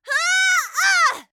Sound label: Mage Grunt Physical; Starburst Sorceress; Realm Royale